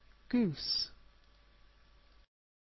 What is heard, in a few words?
goose